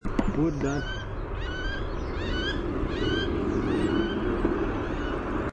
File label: wood duck; dave gammon